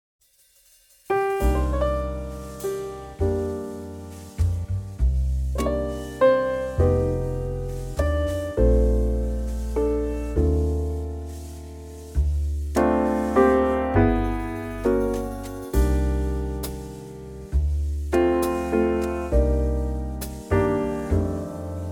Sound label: Trio; key - Bb - vocal range - Eb to A; Superbly classy standard